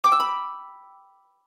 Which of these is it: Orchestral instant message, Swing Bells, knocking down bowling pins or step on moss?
Orchestral instant message